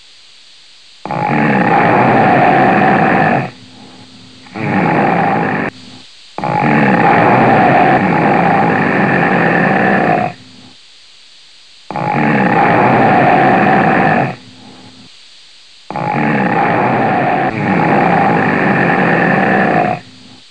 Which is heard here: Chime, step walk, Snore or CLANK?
Snore